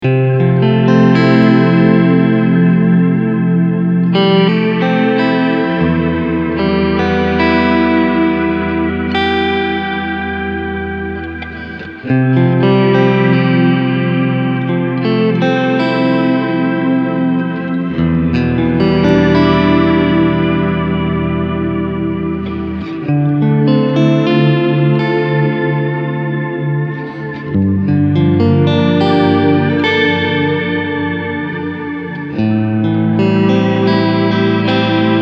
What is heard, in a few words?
shimmer